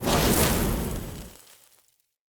Short Sword Fire Whoosh; Weapons; Elemental

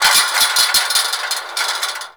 Chain Fall, Dojo; Ambience; Warframe; Mod Quest; Teshin's Hideout

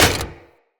Short Sword Medium Impact Dmg Metal

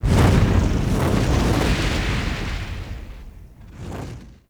Fire Engulf, Exterior; Warframe; Awakening Remaster

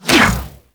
Ball Throw Whoosh; Warframe; Tenno Ball; Missions

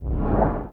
Museum Exit; Warframe; Vitruvian Museum

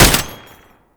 Tank Pintel Turret Shot; Enemies; Weapons; Warframe; Lasrian; LasrianTank

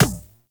Beach Ball Kick; Beach Ball Floof; Water Fight

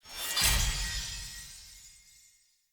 UI Rank Up; Default UI; Syndicate

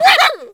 Puppy Idle Speak Excited; Vocals; Warframe; Kubrow

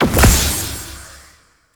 Corruption Pickup Large; Warframe; Zariman; Missions

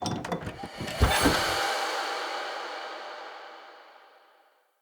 Chest Open Uncommon

